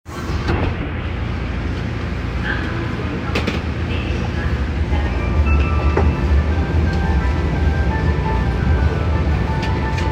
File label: train sounds; 日比谷線東銀座南